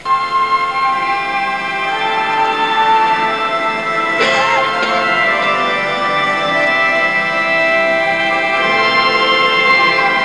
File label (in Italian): alleluia, coro; coro e animatori musicali